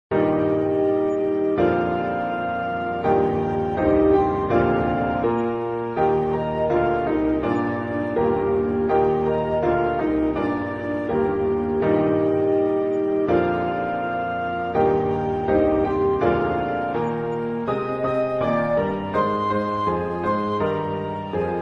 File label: The Great Gate at Kiev Flute Quartet w Piano; Pictures At An Exhibition